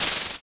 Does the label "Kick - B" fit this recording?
No